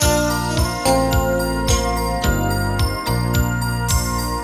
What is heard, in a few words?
twinkle